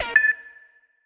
Cancel; ImpGal2

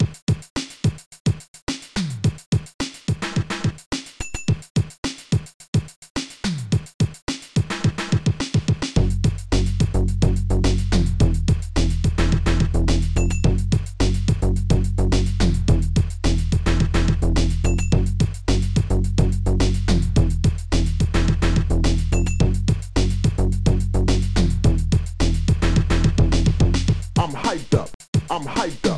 FUNKY RYTHM

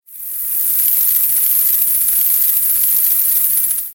bats; Nestle Animal Planet Land

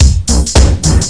HOUS LOOP; DRUMLOOP; eXtended Instrument

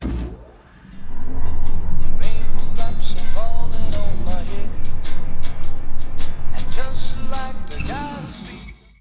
Raindrops; Moss; kiosk

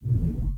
ship engine

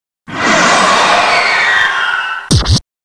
CATCH

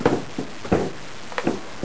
stair; PUZMANIA

